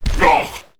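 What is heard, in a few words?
ghost damage, monster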